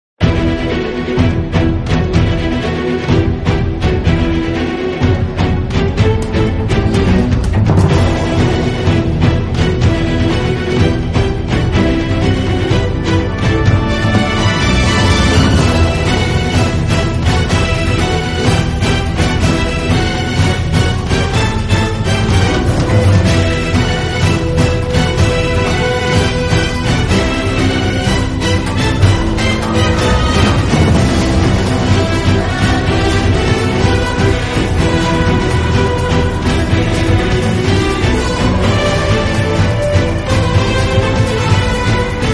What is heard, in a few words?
music